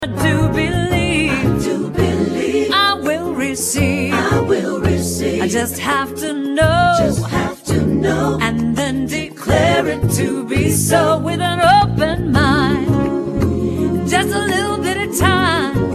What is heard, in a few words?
Receiving Chant